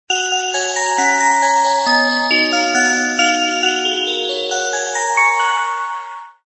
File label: win music; bad ice cream